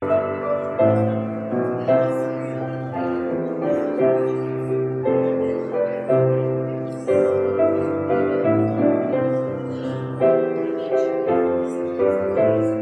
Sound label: preservice, instrumentalists